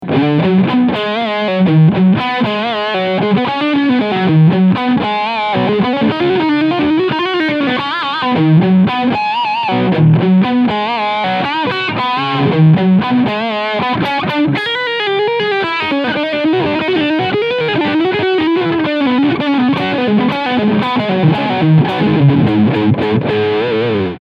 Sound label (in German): Active White Lead Sound Steg Pickup; Harley Benton SC Custom II